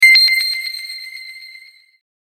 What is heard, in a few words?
Horse jingle, alarms; Lenovo; IdeaTab A7600